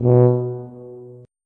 tuba note

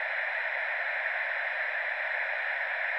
radio static loop

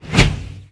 nex whoosh

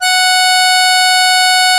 tuba loopnote

